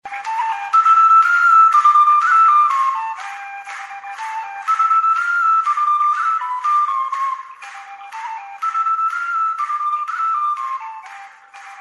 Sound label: Belfast Polka